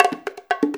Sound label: FILL, Percussion